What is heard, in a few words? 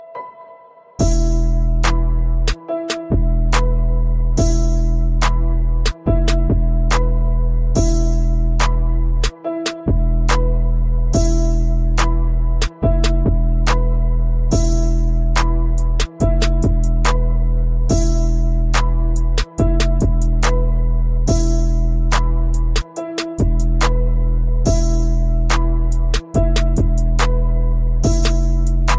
Beats